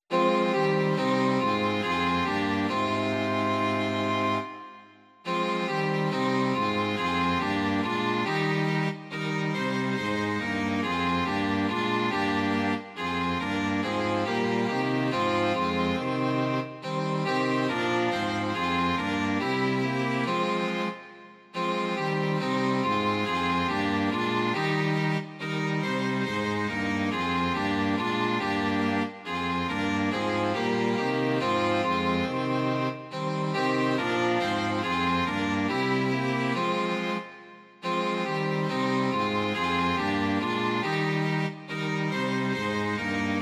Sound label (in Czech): smyčcové kvarteto; Pane, jenž´s mě koupil sobě; Imaginativní verze